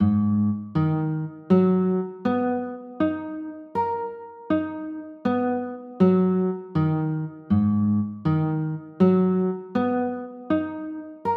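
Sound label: G sharp m6 chord, guitar; g sharp m9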